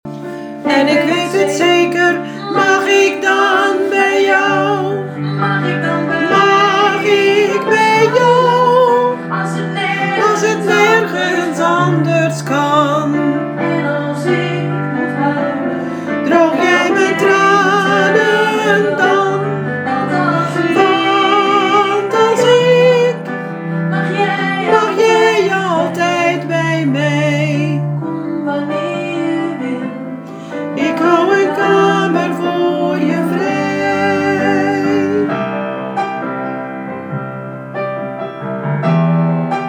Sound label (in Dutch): refrein sopraan, vrouwenkoor